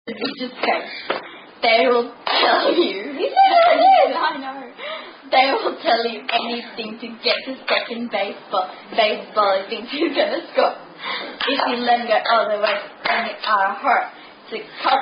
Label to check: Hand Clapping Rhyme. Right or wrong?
Right